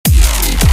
Dubstep

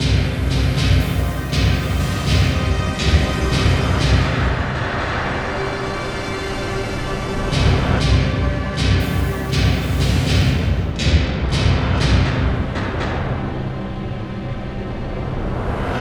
Danger Tonal; Insanely Twisted Shadow Planet

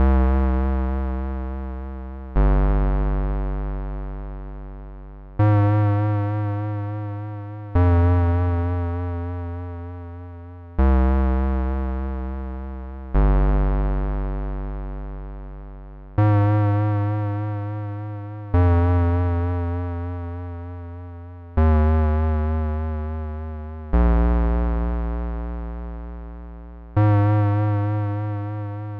Summer Bass; Proteus

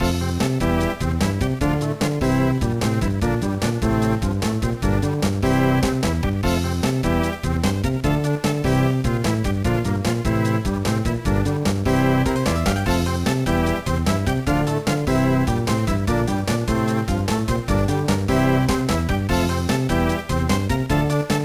BGM; Lode Runner; GBA

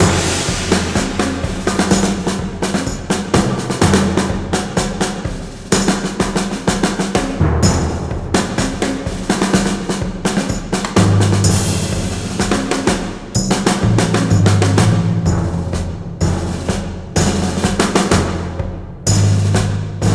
Sting Victory

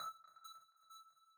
Loading (Jingle)

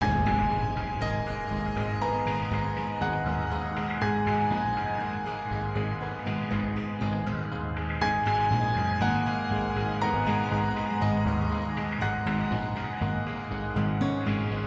Round Start; Decay; Natural Selection 2

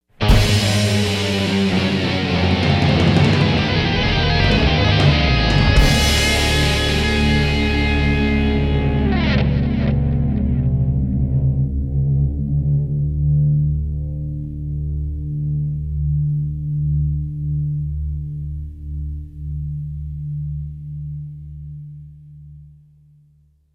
Fail; Brutal Legend

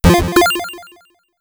button

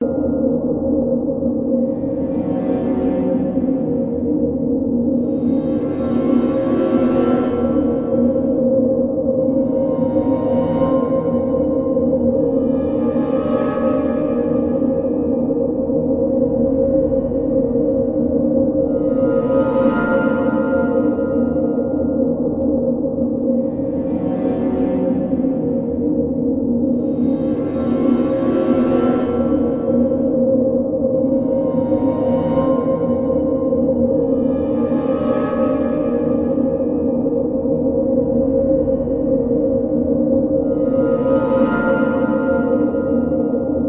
Pad

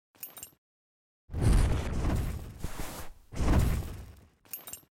Potion Craft; Goddess of Trampling

